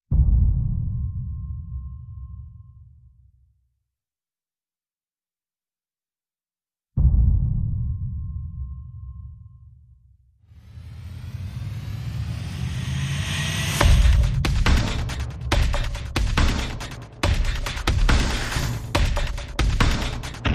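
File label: percussion and FX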